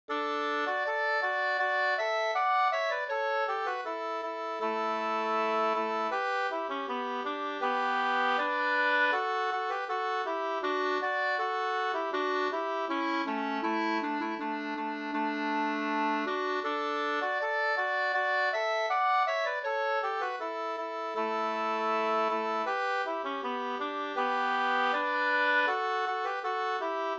brudmarsch; fr Sorunda

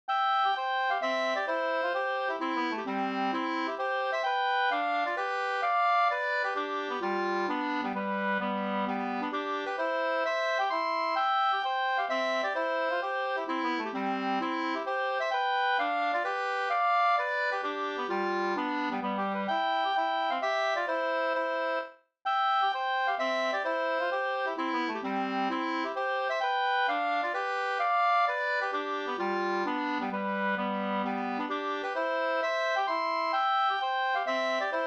hambopolska

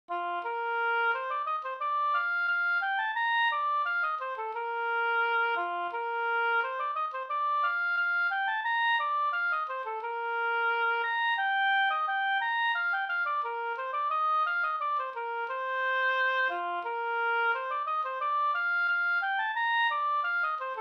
skanklat

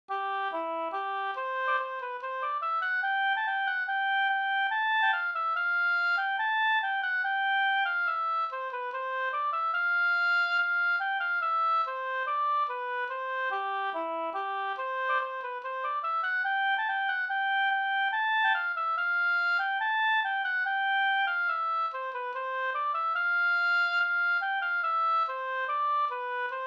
skanklat; Robert Nordstrom Lerbo

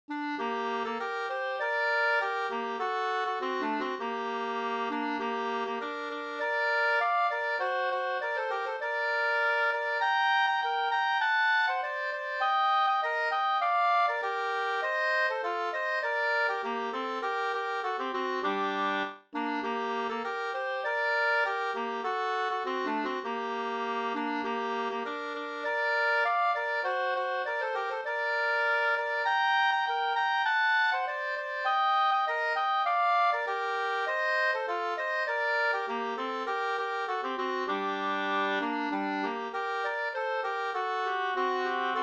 vismelodi locklåt; Locklåt av Erik Eklund, Skogstorp, Södermanland; med andrastämma enligt Stockholms Spelmansgille

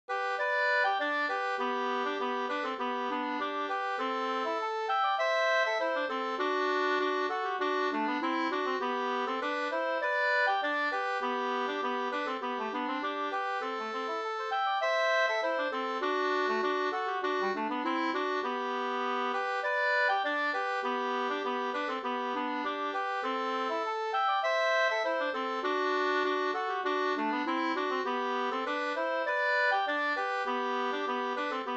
snoa; fr Norrland

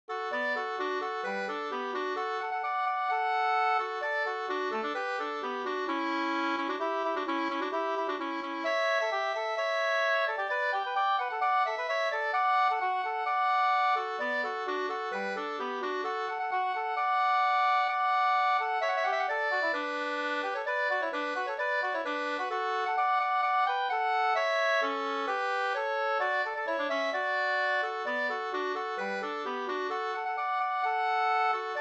hamborger

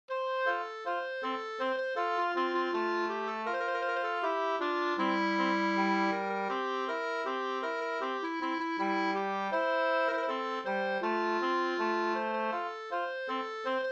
polka polkett; Talgoxen Up 2st

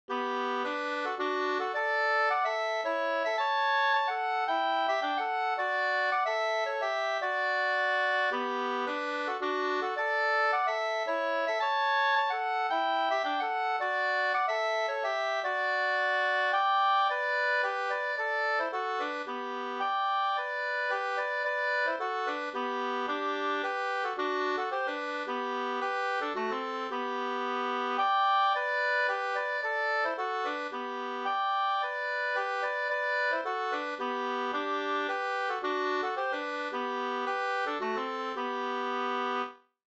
hamburska Gokpolska

